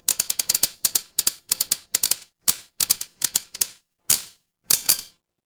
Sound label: mechanical slot machine